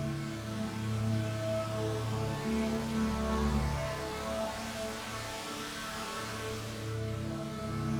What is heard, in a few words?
Drone; stereo toolkit samples; Non Tempo Loops